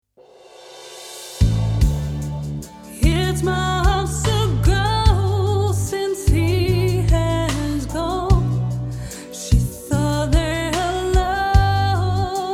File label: Reverb Soundtoys Little Plate